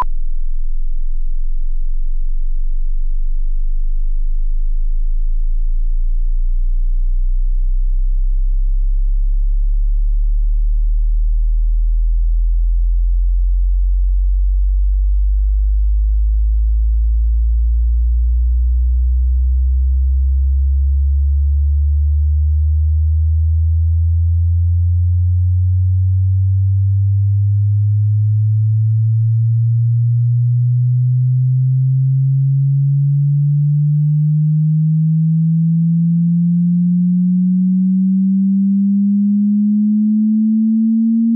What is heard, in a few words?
sweep